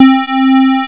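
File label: square lead; gmplay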